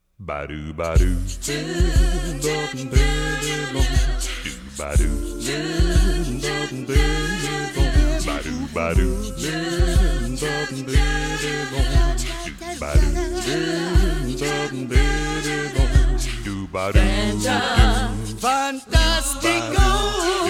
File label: Jingle; Fanta